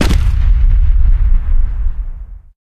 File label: land; taunt; player; yeti; video games; source engine; tf